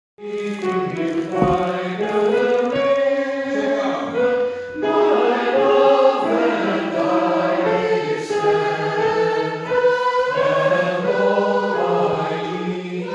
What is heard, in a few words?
Sopraan Tenor; The Sally Gardens